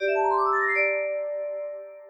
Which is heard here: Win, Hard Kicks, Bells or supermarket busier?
Win